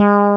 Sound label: Fretless Bass Gb1